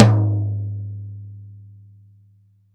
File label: TOM, Drum Dry; East Collexion; Drum 1